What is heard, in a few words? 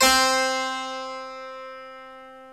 HARPSICHORD, Keyboards; E-MU Producer Series Vol. 2 – More Studio Essentials